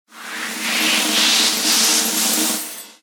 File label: wild melody; Grand Tiger